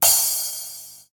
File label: Royality free cymbal sample tuned to the D note. Loudest frequency: 8906Hz; cymbal sound clip D key